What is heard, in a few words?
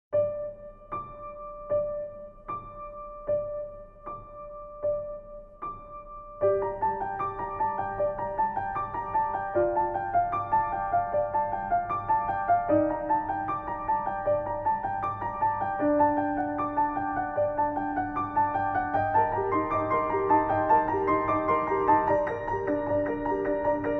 Piano